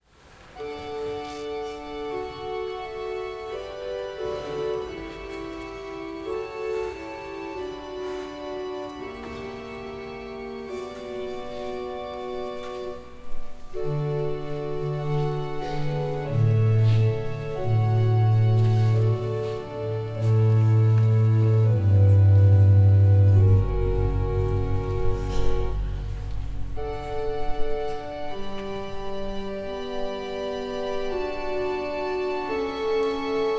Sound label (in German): Vom Himmel hoch fuer Orgel